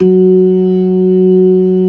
LOW MAN, organ combos; Keith Emerson Signature Series

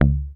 HOFNER MUTE, Bass

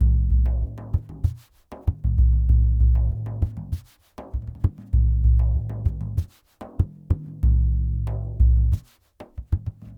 Lg Frame, World Percussion

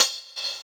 Hhat, Hihat; Equipped Music; Loungin House

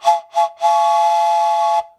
Train Whistle, cartoon Foley Fx; musicradar; cartoon caper

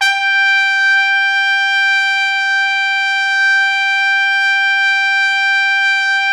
G4, Pop Brass; Best Service ProSamples vol.19; AKAI

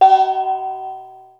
EXOTI GONG; Greytsounds; EXOTIC GONGS

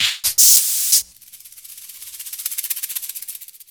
808 trap Shaker; Sliced

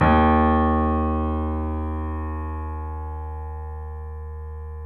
D#, Boesendorfer, 3D Pianos; E-MU Producer Series Vol. 5; 3-D Audio Collection

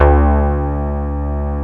G3, FM PIANOS

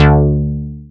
bass, synths

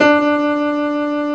REV, REV PF; AKAI S-Series CD-ROM Sound Library VOL-2

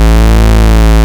J60 PWM; E-MU Formula 4000 Series Vol. 2

